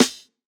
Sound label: Snare, jungle breaks drum sounds